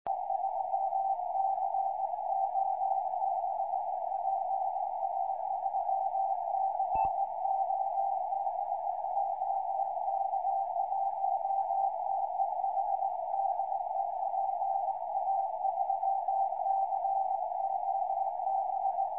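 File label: CW qso, 432 MHz, amateur radio; PA3COB JO32MF 568; qso PA3COB - OK1TEH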